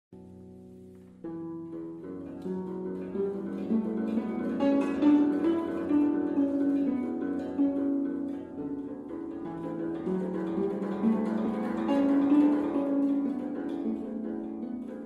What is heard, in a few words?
Koto, ساز ژاپنی